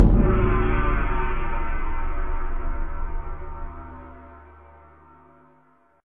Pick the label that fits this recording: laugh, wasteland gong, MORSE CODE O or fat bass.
wasteland gong